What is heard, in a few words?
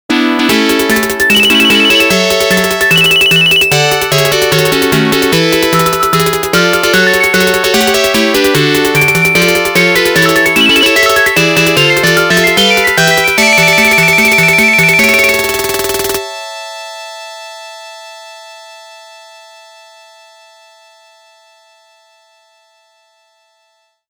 All Clear; senjou no ookami top secret original sound collection 2015